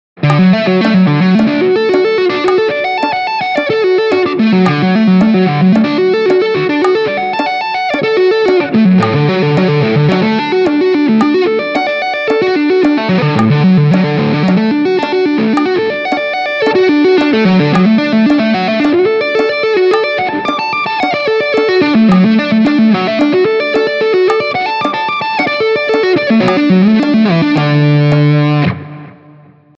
Concatenazione rivolti

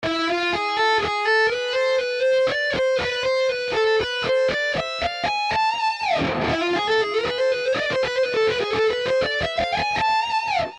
Esercizio 5 Minor Harmonic B, guitar

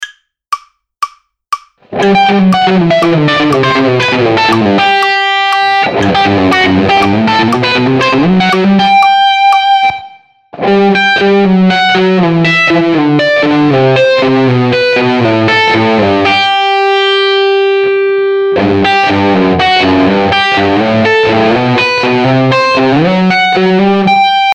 Hybrid Salto 2 corde

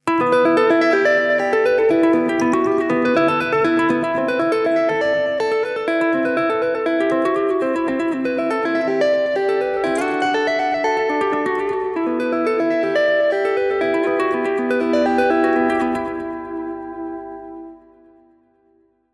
tapping 8 dita

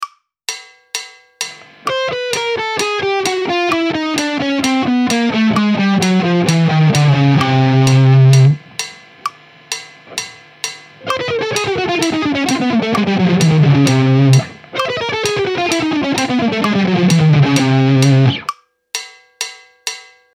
Scala cromatica Do discendente; Esercizio 2

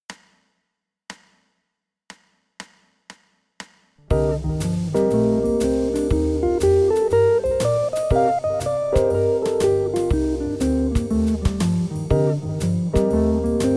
Box modo frigio, guitar

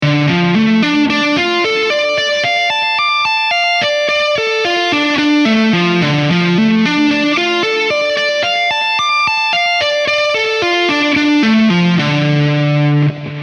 Re minore con ribattuti

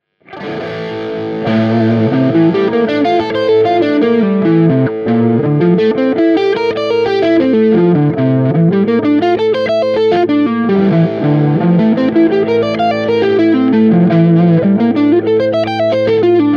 Arpeggi Modali frigio

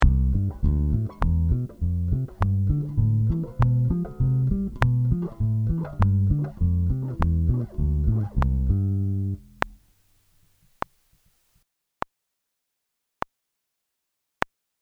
Pizzicato 4 dita sedicesimi

